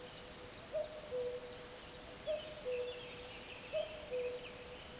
Cuculus canorus

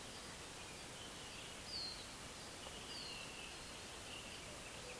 Carduelis spinus

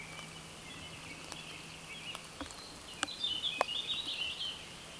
Sylvia atricapilla

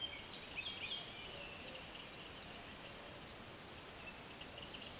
Phylloscopus collybita

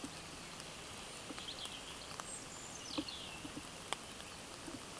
Erithacus rubecula

